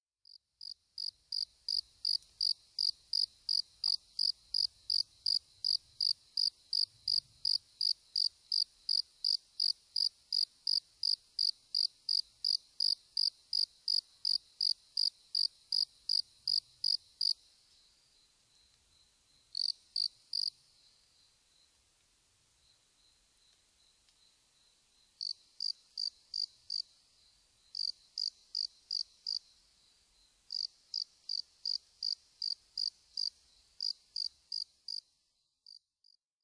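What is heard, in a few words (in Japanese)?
tuduresasekoorogi, Japanese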